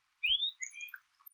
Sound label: Chirp